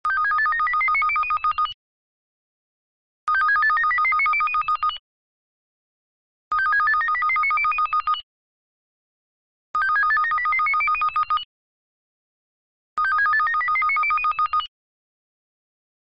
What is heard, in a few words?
Alert; Samsung SCH-R220 Jitterbug Plus